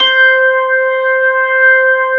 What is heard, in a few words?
B3 C5, organ; AKAI S-Series CD-ROM Sound Library VOL-2